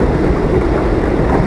tanks; ambience; narshaddaa

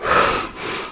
moan

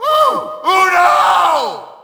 Shout, audience; UFC Tapout 2

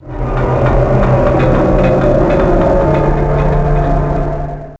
radio mast creaking; Max Payne; penthouse

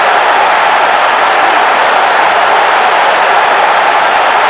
Medium Crowd Cheer; David Beckham Soccer; XBOX

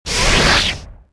beam stop; cairn

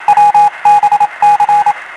morse; WhiteOut

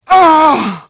dead wom, female; voices; Pirates Of The Caribbean

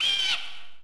bird; Tony Hawk's Project 8; XBOX

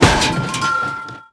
Target Pickup; Cars; XBOX

